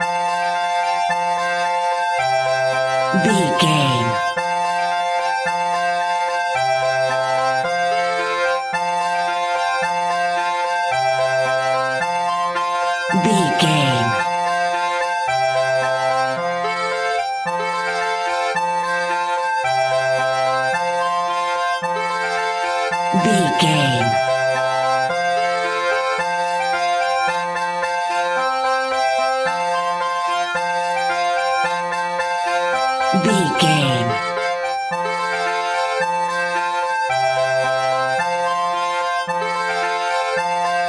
accordian, Squeeze Box; Mary Had A Little Lamb Squeeze Box

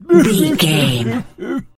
voice male cry whimper

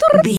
voice fun character vocal crazy

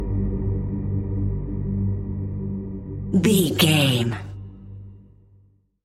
suspenseful mystery lurking stinger

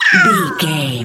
goblin fairy hurt pain

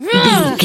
voice female attack

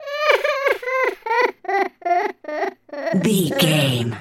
goblin fairy cry low

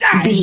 voice male attack

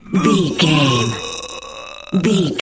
voice male death torture

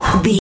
voice male breath pant

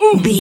voice male hurt pain

